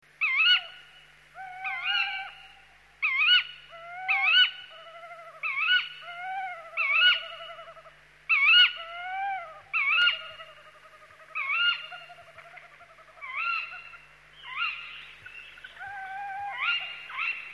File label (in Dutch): Bosuil territorium; broedvogels